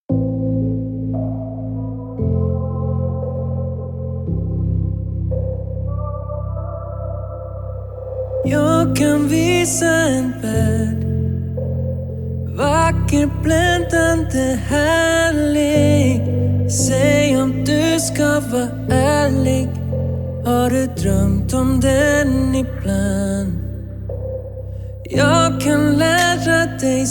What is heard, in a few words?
Contemporary Jazz